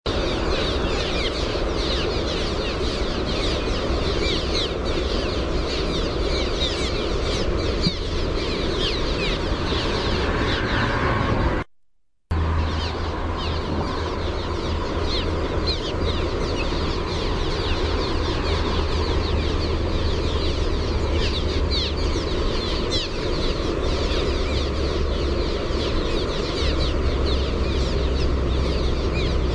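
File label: cliff swallow chur, Petrochelidon pyrrhonota; Passeriformes Hirundinidae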